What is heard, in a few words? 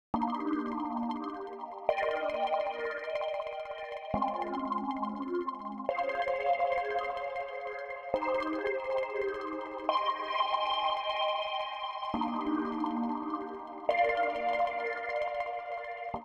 synth atmo 32 beats